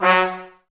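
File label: trumpets F#, brass; Miroslav Vitous; Woodwind Brass Ensemble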